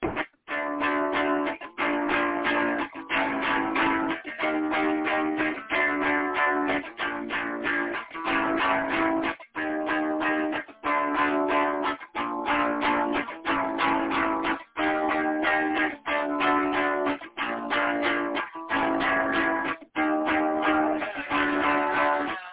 unhappy dissonant chords and chant

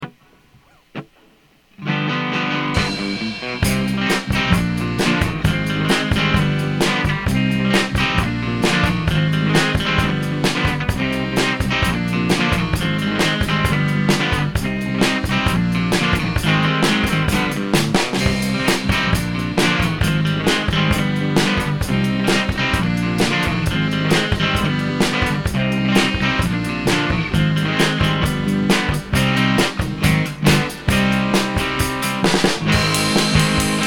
from Guitar Rhythm

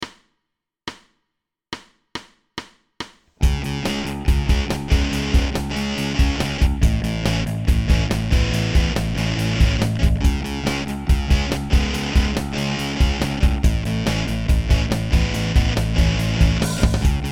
fuzzy

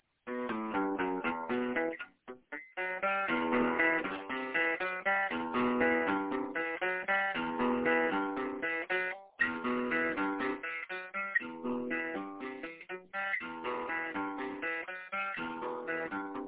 gloomy acoustic gtr intro

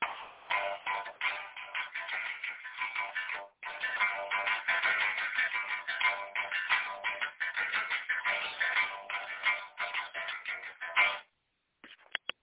could be so metal plugged in

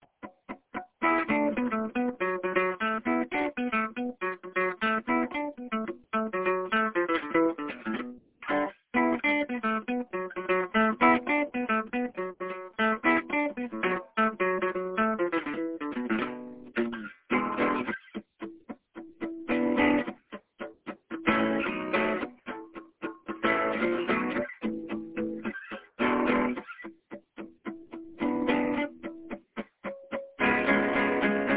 backwoods groove

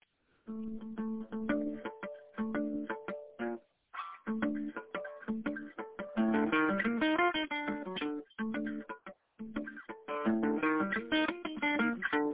harmonics and classical spanish

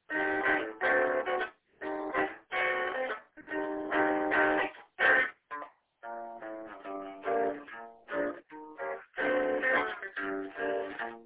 minor swing thing

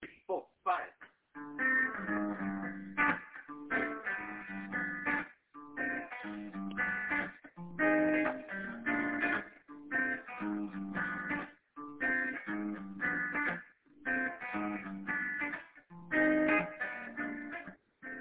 somewhat intellectual jazz swing and chords

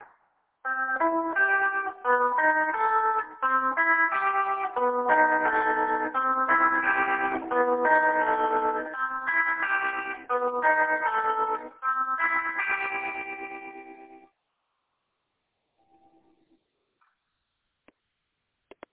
out there arpeggiated chords

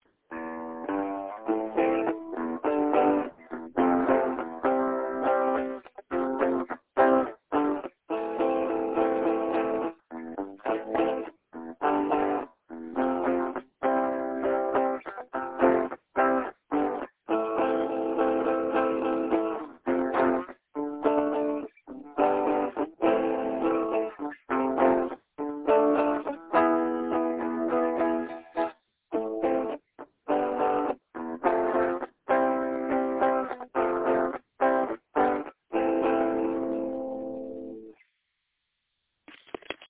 little guitar amp big sound

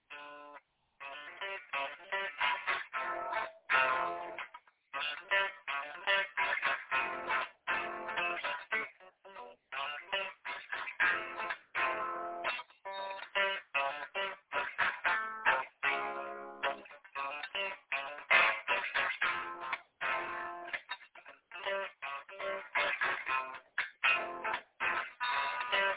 fast intro lick

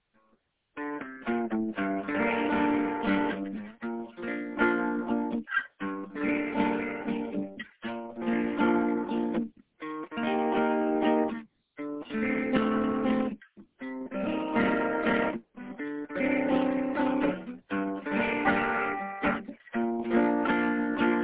riding slow and sad throught the desert

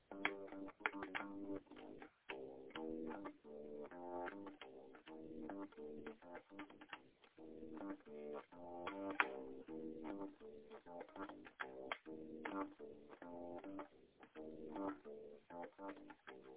anglicanized samba ish bassline

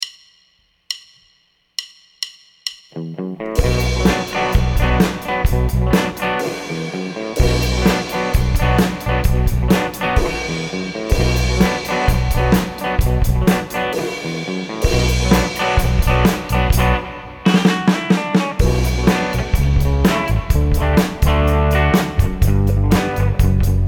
backing

